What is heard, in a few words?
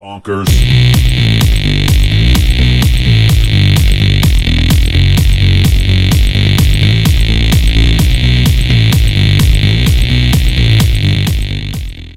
strong beat ringtone